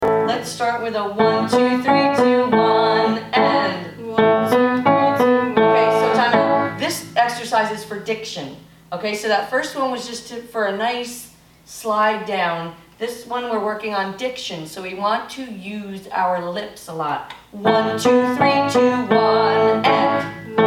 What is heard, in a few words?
Vocal Exercise